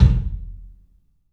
KIK, LIVE CLUB KK, Drums; 3D Sound

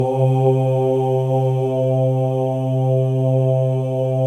male ohs; Spectrasonics; Symphony of Voices

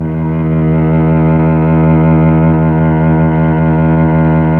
STR, Melo. Cello, Slow Cello; Roland LCDP09 Keys of the 60s and 70s 1

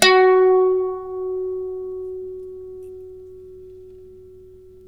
f+3, Mandolin; Guitar; Terkelsen

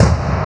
KIK, Kicks; AMG; Now CD-ROM (Roland); NOW! Drums

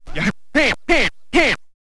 scratch kit, vinyl

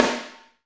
SD Real, Roland; Sound & Vision - Gigapack I

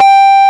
MILD HARM G3, GUITAR; AKAI S-Series CD-ROM Sound Library VOL-8

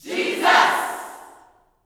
"JESUS", Gospel, YELL SHOUT; ILIO; Vocal Planet

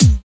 HIP NOTIC K; Drums In Your FACE!

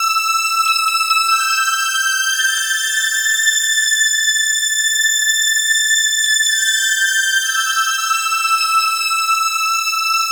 String; Sonic Foundry (Sony Creative Software); Infinite Soul VOL-2

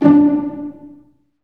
STR SEC, STRINGS; Roland - LA Composer vol. 1

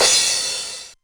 909 Crash, Novation Drumstation; Novation DrumstationV1

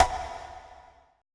CONGA SLP L; BPC DRUMS

